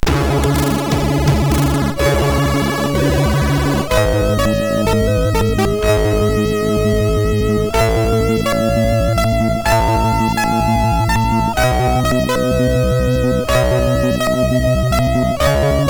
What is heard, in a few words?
Hawkeye loader, MOS6581R4